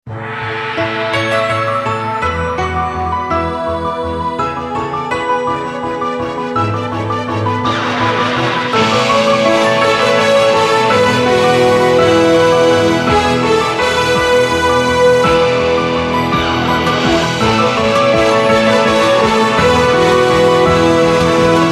SINTONIA; SGAE

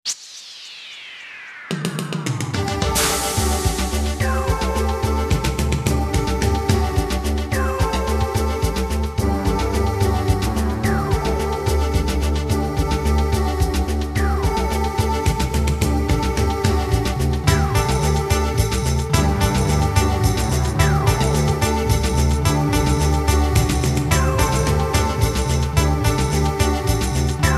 FONDO Noticias; RNE

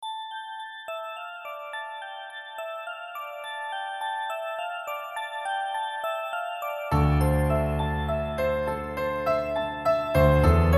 Vestidos de Arlequin (Pno Bell)

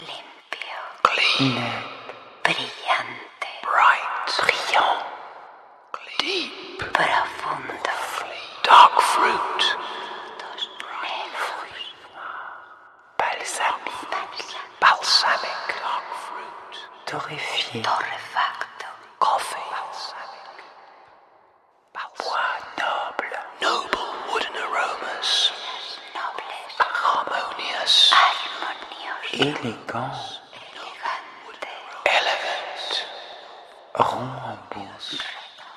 VOCES SUSURRADAS

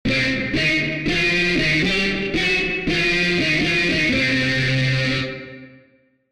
voc lead distorsion

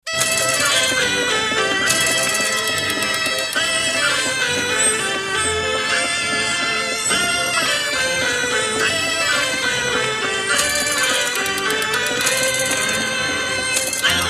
Carrusel; TEATRO

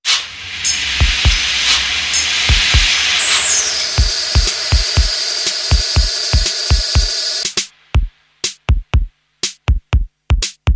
maletas beat bit

